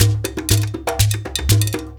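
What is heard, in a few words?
Djembe; Spectrasonics; Heart of Africa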